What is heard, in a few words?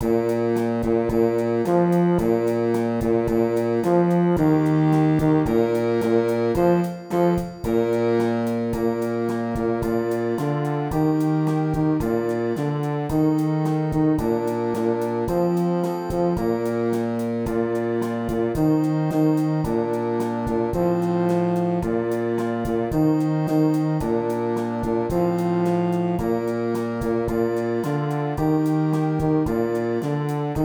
tuba